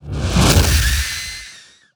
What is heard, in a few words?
Windhawk Death